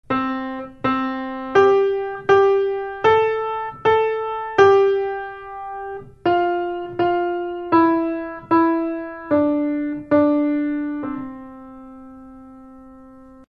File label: piano twinkle